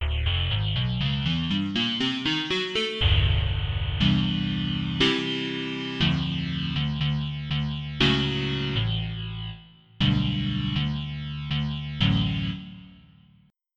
Wah Fast, synth

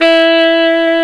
tenor high

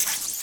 roll; Spider-Man CD Cardz Green Goblin

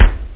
real kick; kicks

